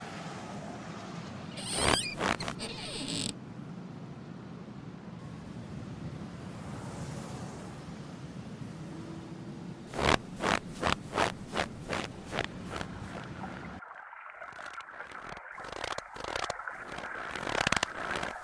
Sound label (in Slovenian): Vrata sneg potocek